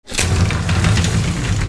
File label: HOUSE